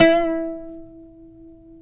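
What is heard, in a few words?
pluck OUD; Roland - LA Composer vol. 3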